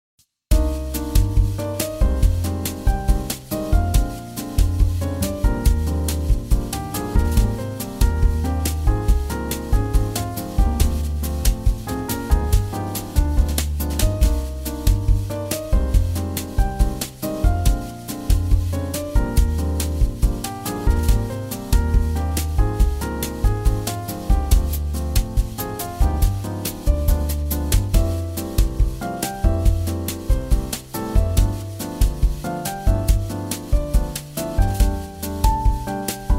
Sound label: Bossanova